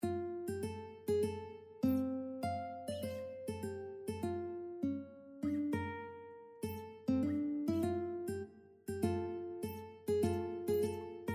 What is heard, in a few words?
UKE, ukulele